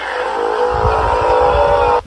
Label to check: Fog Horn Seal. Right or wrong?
Right